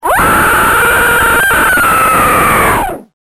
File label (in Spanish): GRITO